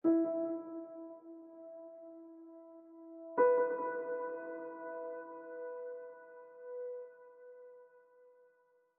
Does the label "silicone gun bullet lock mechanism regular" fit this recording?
No